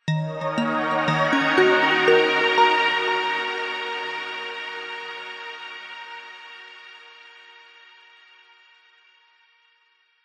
multimedia musical logo ident warm positive arpeggiated